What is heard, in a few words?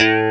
E GUITAR A1; 4 El. Guitars